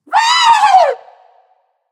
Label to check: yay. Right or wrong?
Right